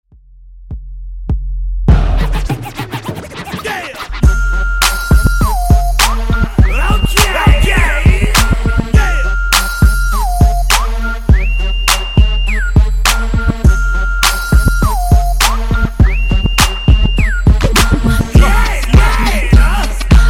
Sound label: Funk Transition 102 128bpm